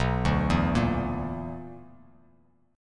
B-Minor Major 7th Double Flat 5th, Bass Standard; BmM7bb5 arpeggiated; JGuitar

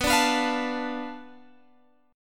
B Minor 7th Flat 5th B Guitar Open G

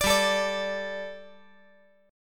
G Suspended 2nd, Guitar

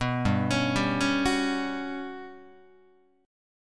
G Major 7th Flat 5th, Guitar; arpeggiated; JGuitar

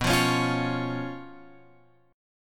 B Suspended 2nd Flat 5th B, chord; Bsus2b5; {7 8 X 6 6 9} strummed

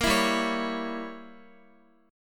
G Diminished 7th G down Guitar; strummed; 4E,4A,5D,5G,5C,6F; Gdim7

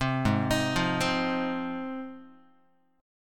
G sharp 6th G sharp down Guitar, chord; arpeggiated; JGuitar

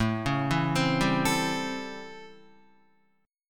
A 7th Sharp 9th A, Guitar; A7#9; arpeggiated; JGuitar

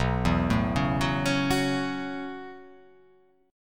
B 7th Suspended 2nd Suspended 4th B down

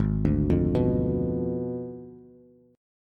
Ab Diminished 7th Ab, Bass; Abdim7 arpeggiated; JGuitar

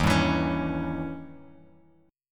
E flat 7th Flat 9th Bass, chord; Bass Standard; Eb7b9 strummed; JGuitar

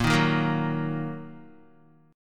Bb Suspended 4th Sharp 5th Bb down Guitar; Bbsus4#5 strummed

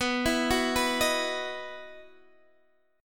E Major 7th Suspended 2nd

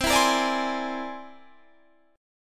C Augmented 9th

